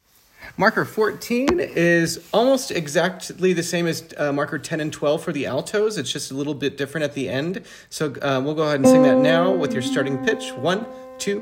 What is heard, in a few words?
Alto, choir